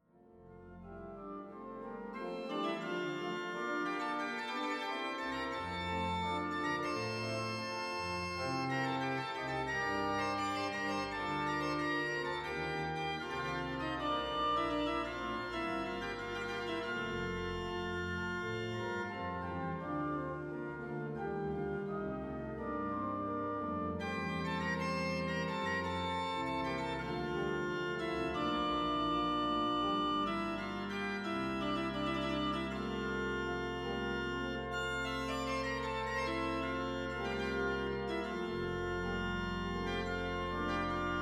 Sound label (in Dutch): Hinsz-orgel klankvoorbeeld; Appingedam